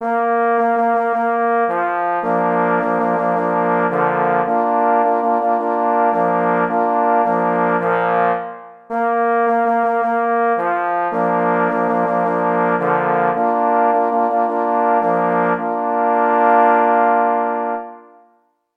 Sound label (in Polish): Fanfara dekoracyjna na 3 parforsy